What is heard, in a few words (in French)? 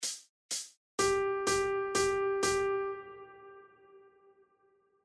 Noires Binaire